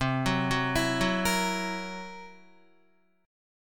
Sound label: C 7th Flat 5th C down Guitar; C7b5 arpeggiated; JGuitar